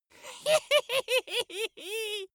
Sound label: dwarf laugh gleeful